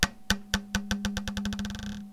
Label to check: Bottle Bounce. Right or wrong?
Right